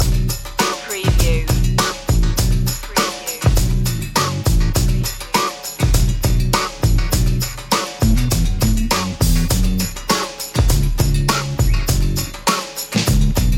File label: super funky loop